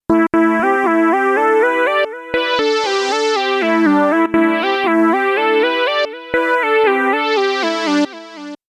12 SAW Lead MW

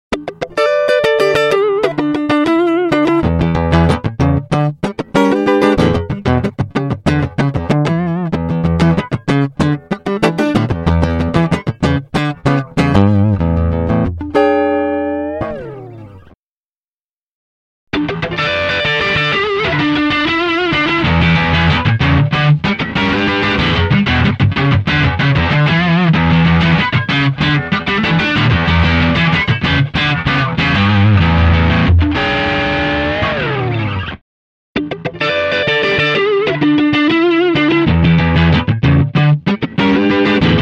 Elec Gtr funk riff E min

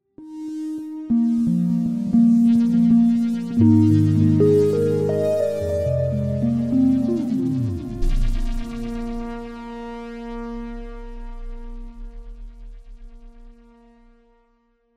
wisper; k2600; Triple Mode

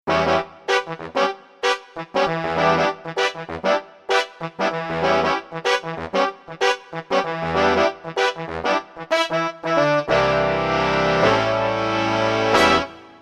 BigBand AMradio, Pop Brass; PC3

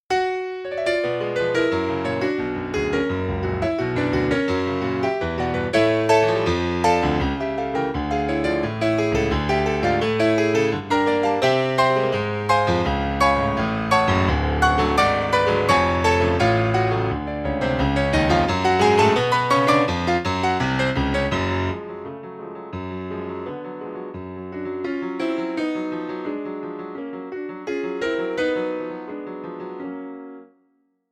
Studio Grand, Piano

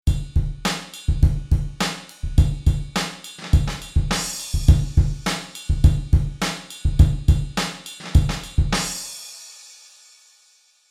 Retro Drum; Beastie Retro Drum; PC3

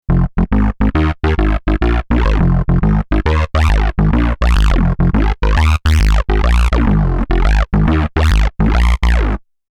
Bass; PC3; Daywalker

